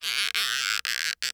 Cartoon Balloon Squeaks; AdobeCC

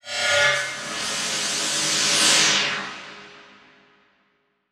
Transition Laser Horn; Adobe; Production Element

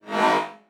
Flash Animation Short

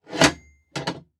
Foley Lock Metal Lock Movement Slide

